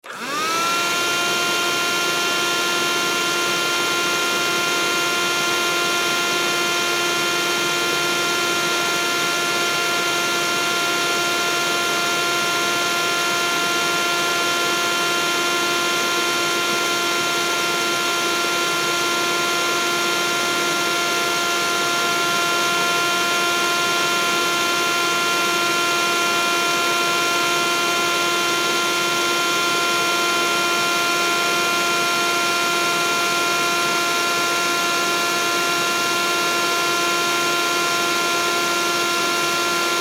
Hand Held Vacuum, Hand Held Vacuum Attachment On Run Off; Appliance; Cleaning; Household; Blastwave